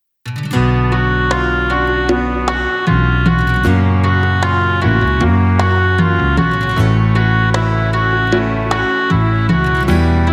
Chuyen hop tan beat

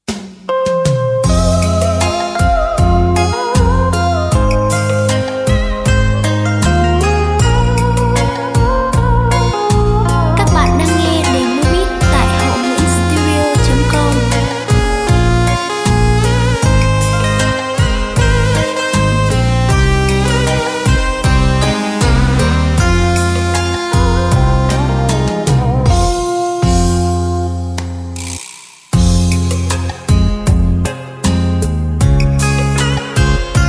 beat

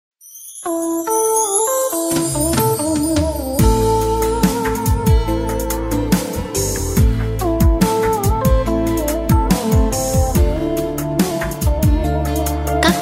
lo yeu beat

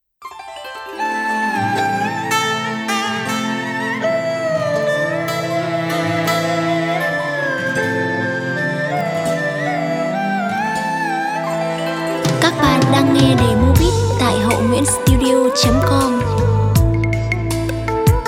beat

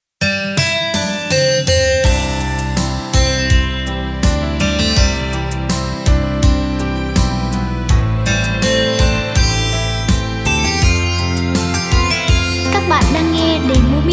beat

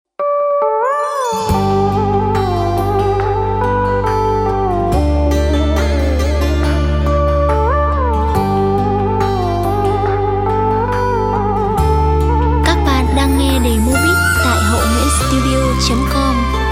Dau dam treo cao beat

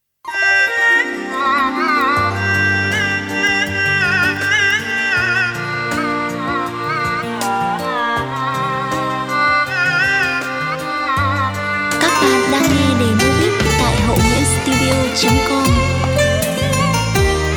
Ngo loi beat

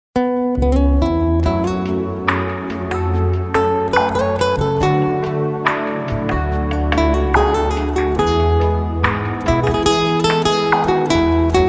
beat

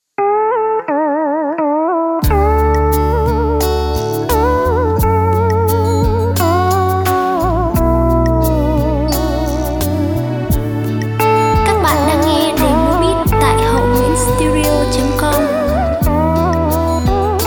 beat

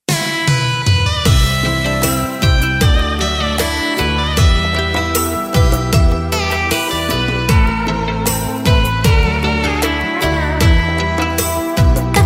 Nhat nang beat

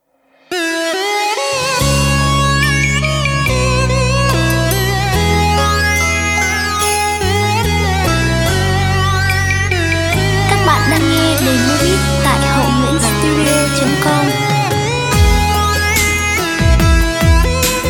beat

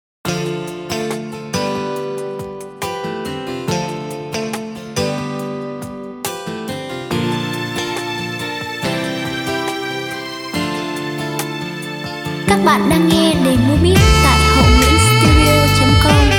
beat

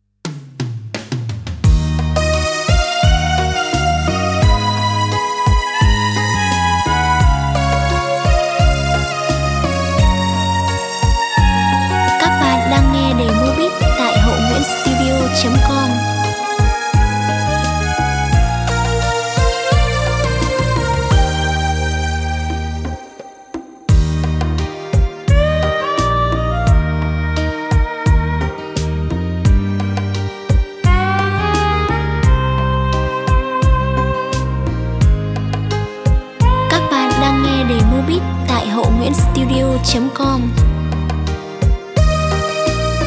beat

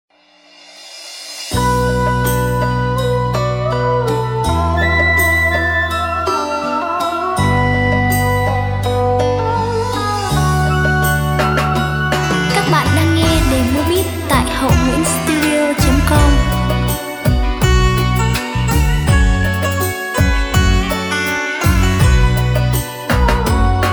beat

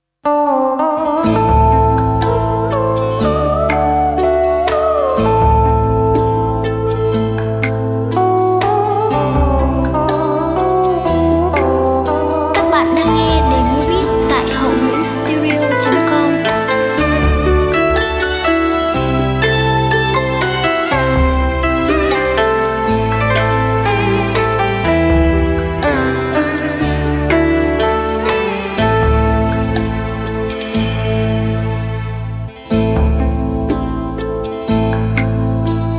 beat

